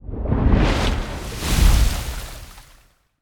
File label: Underwater Creature Emerging Water